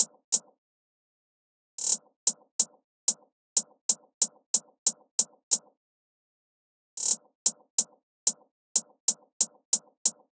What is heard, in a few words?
Hihat Loop 185 BPM; Stomp It